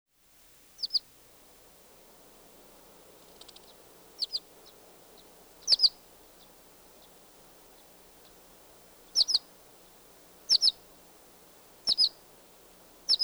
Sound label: Violet-green Swallow, Tachycineta thalassina; swallows swifts